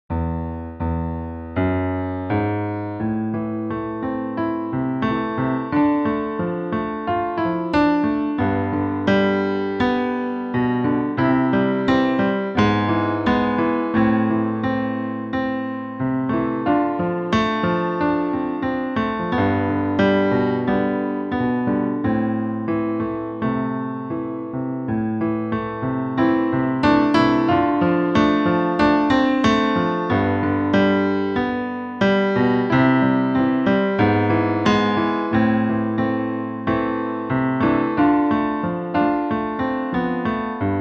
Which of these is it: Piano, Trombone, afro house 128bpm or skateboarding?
Piano